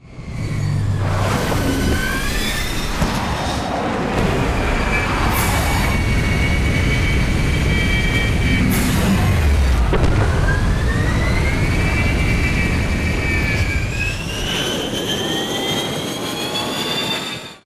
flyer dropship attack vertical; crow; Titanfall 2

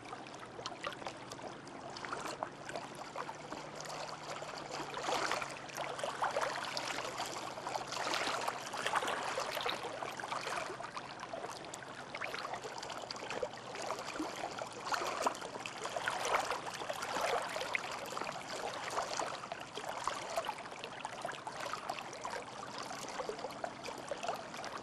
amb dock water; angel city; Titanfall 2

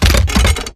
Metal Jog; Titanfall 2

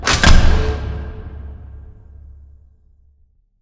cooling panel stop; Titanfall 2

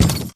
Sentry Turret Footstep Generic; Boost Card; Titanfall 2

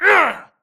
grunt death quick; Titanfall 2

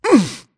grunt hits; Titanfall 2

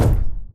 Footstep Jog Mid; Titanfall 2

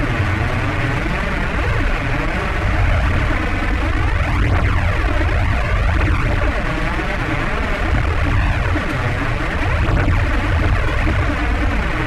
giant fan scifi distort; Titanfall 2

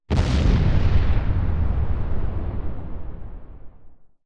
weapon fire explo; Titanfall 2

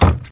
Mvmt FS Jog Wood Layer; AI Stalker; Titanfall 2

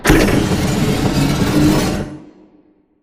Car Rotate Start; Titanfall 2; Boomtown

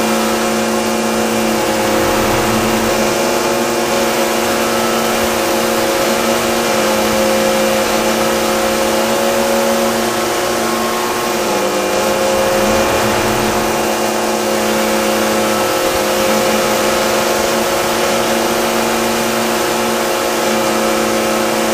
Above Dome Static; Boomtown; Titanfall 2

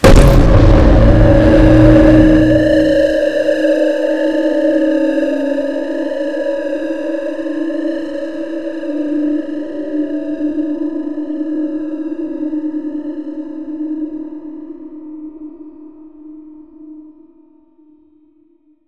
tower major pulse fire; boneyard; Titanfall 2

